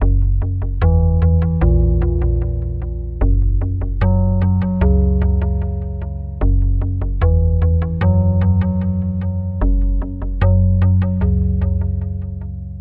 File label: Pluck Bass Echo, Synthetic Pulses